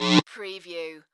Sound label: Electric Swell Fast